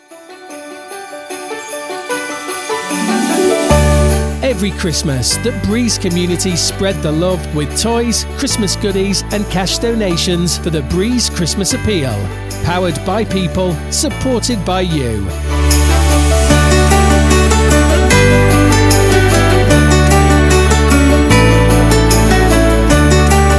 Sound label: Christmas appeal bed; jingles